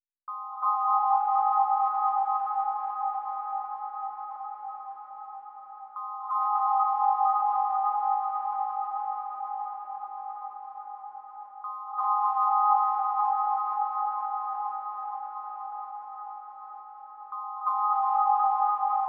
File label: 169 BPM F Min Mellotron; Cymatics; Twilight; Destiny Gold Expansion